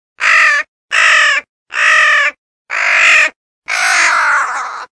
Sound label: Young Crow Distress